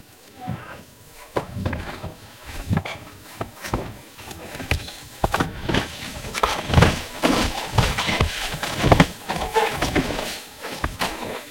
the sounds of a stable. horses raking and shoveling footsteps pastoral relaxed quiet.